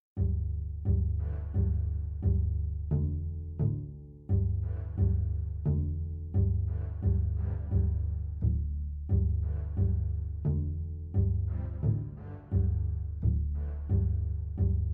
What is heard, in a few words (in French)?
Duo contrebasse; contrebasse 5 cordes; ctb 5 cordes